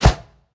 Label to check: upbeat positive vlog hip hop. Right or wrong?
Wrong